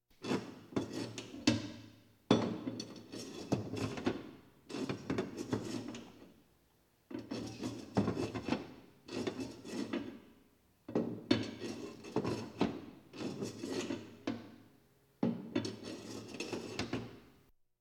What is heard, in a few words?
Police, Fire engines